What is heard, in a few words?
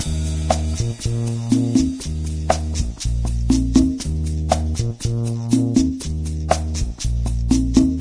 Cafe Music; Diner Dash 2 Restaurant Rescue